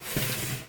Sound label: slide out